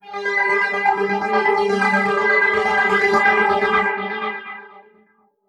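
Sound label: Modulation Processed, alarm siren samples 125bpm; musicradar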